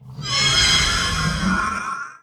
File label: NPC Killed